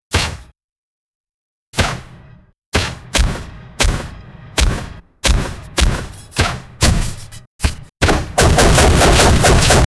lightsabers buzzing; fictional

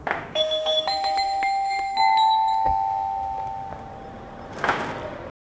shop door opening violent, bells

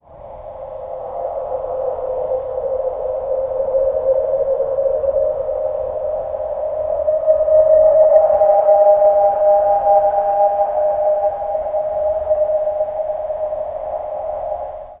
spooky night ambiance, creaks

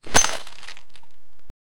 pick up item sound, bag

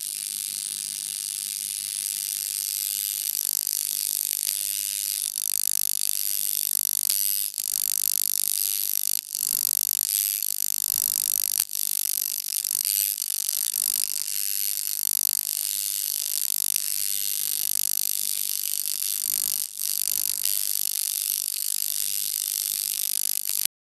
magic wand charge loop; charging

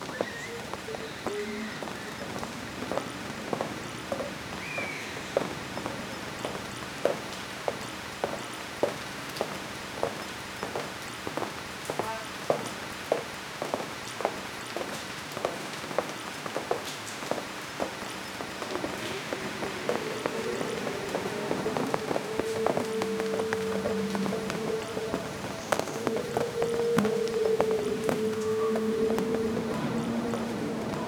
rainy street a man walks, car crash

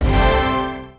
impact, sound-effects

